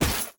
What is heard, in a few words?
Armor